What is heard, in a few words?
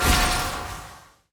enemy boss dark binding projectile fast; bloom minigame